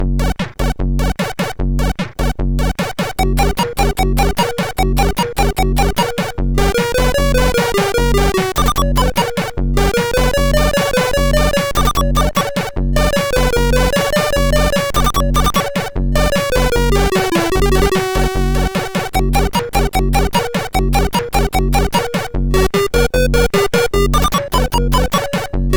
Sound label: Arabian Music sid MOS6581